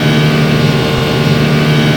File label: engine hi speed loop